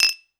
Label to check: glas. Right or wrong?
Right